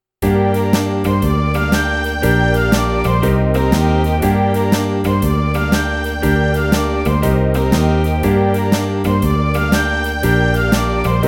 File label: Penta in Gm 120 Country Hit